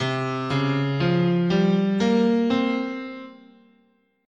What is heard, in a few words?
C Traditional Japanese "in sen", scale